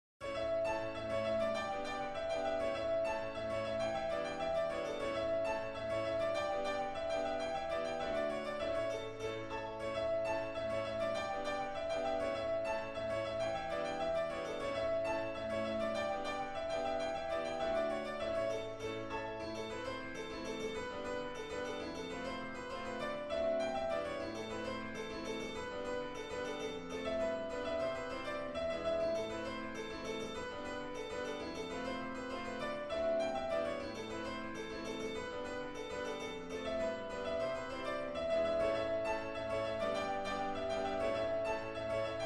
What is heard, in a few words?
chords